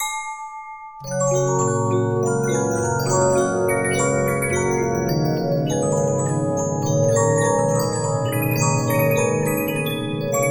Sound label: Regina, 15inch disc musicbox